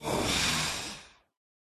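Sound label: pterodactyl die; pterodaktilj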